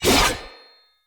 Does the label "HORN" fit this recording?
No